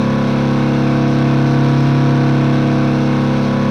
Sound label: i4 petrol small, engines; acf engines